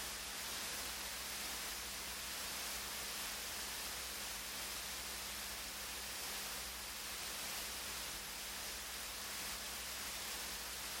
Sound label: min speed 300 RPM, cooling fan; Frequency Plots (Advanced Mode – High Speed); phanteks t30 120